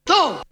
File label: Vocal; ZERO G - Datafile DISC 2